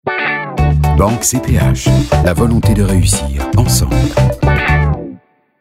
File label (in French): Jingle